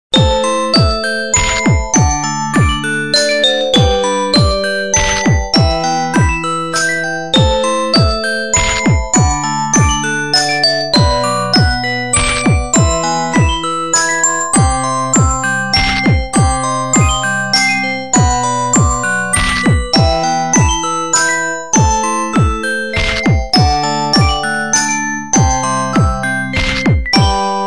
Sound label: Orgol; LG U8500; RingTone